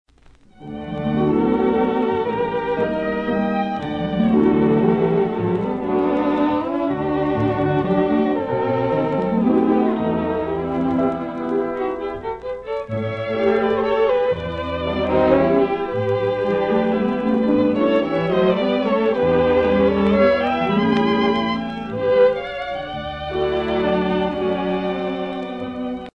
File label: Waltz